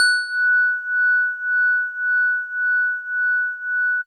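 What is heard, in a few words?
House Organ DX100 F#6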